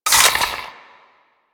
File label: Mech, Weapons Cannon; Kuva Zarr